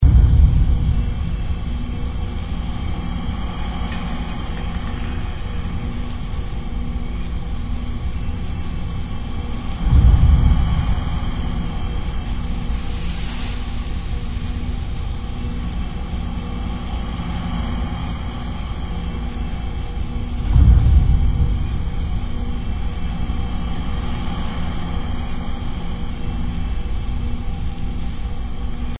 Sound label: stairs; Страшные звуки для монтажа